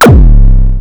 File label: Roland Sh-101